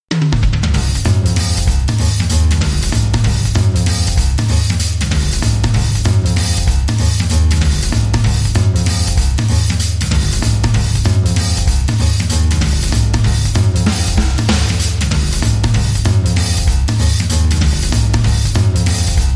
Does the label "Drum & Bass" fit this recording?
Yes